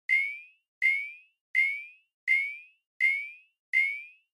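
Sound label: Flash Alarm Thin Elect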